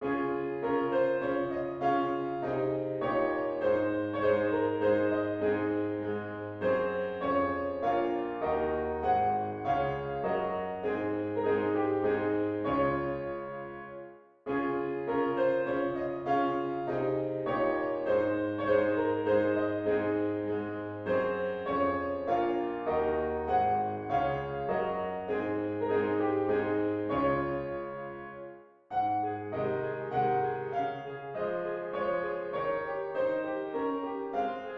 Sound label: valse; ra majeur